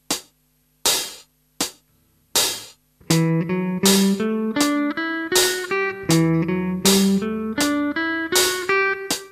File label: natural minor scale